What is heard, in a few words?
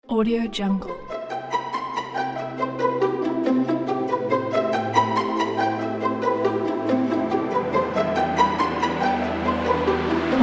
christmas major trap